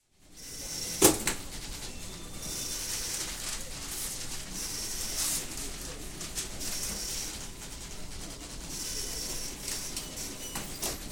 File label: Teleprinter Room atmosphere, with telex machines, tearing of paper, distant typewriters & telephones; Miscellaneous Electronic; Recorded at The Times; BBC